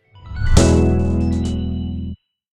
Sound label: Victory Jingle; Smashy Road Arena android ios 2016